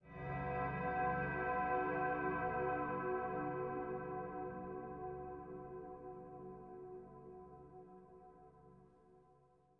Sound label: music - totalitarianism; You Are Empty; kuranty short1